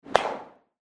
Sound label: ricochet; nerf; vortexPyragon